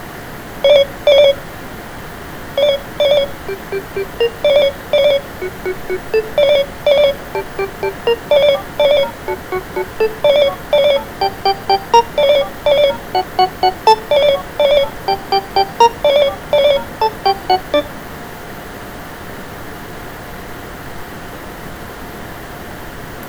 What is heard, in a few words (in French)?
sonnerie snom m3 raw